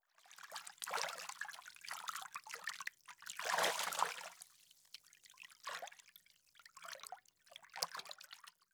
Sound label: Water Movement Light Splashes; Fairlight Sound Lib